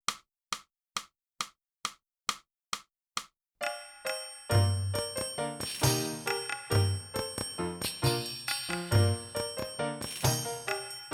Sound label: sax